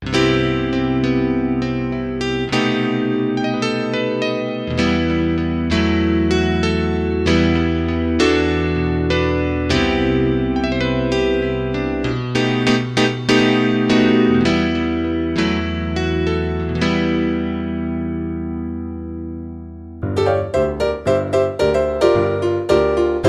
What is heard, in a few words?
Kronos Stage Piano